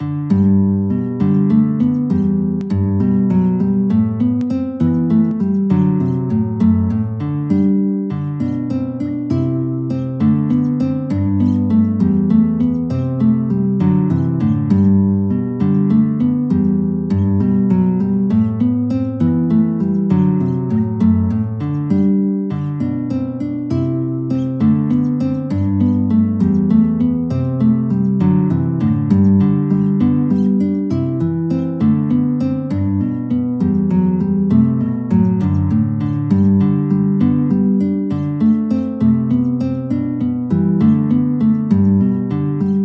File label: guitar